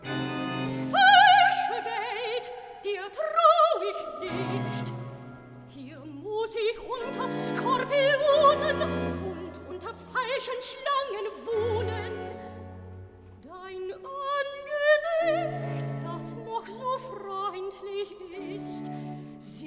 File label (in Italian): Cantata, Recitativo (Sopran); BWV 52 'Falsche Welt, dir trau ich nicht!'; Bach; Winchester; 23rd Sun After Trinity